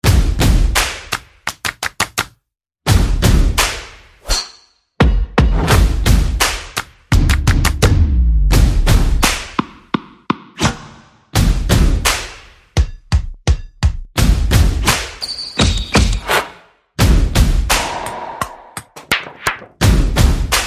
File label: Buzzer Beater, No Vocal Effects